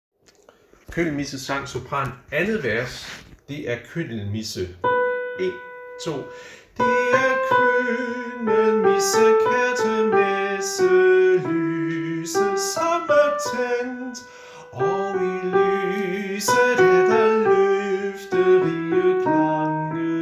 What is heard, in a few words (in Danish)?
sopran; harlev koret